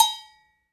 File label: PRC COW SM, Cowbells; Roland - Rhythm Section; Latin 1